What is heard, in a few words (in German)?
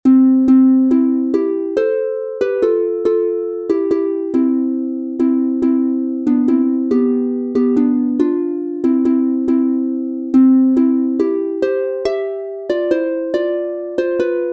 C-Dur instr, Harfe; Es zogen drei Burschen